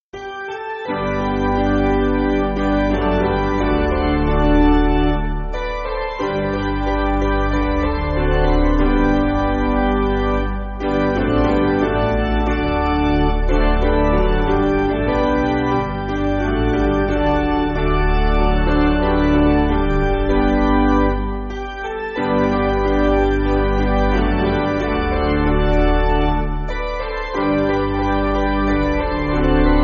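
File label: Piano, Music Backing Files for Churches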